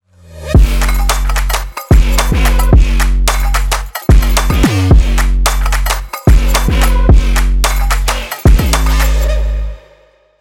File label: dynamic street pulse